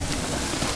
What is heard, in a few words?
slide loop